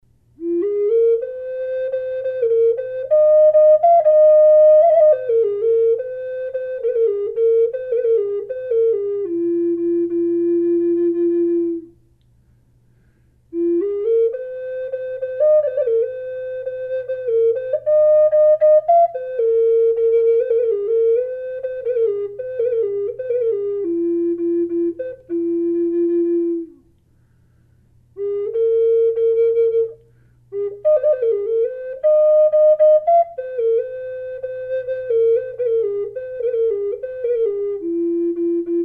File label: raven f minor flute